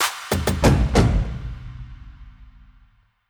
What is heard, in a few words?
Drum Fills